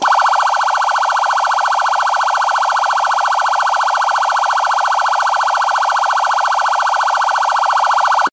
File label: Hyper Yelp Siren